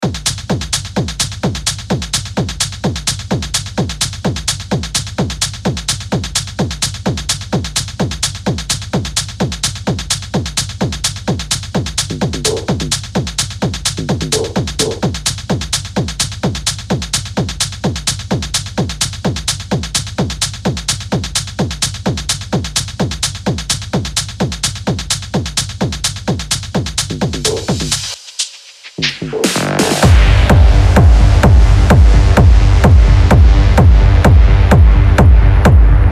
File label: groove